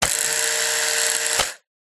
household razor electric male motor whirr close up open short burst; remington